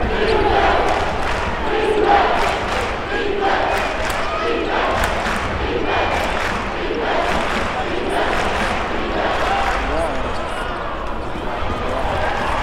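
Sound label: Arena Defense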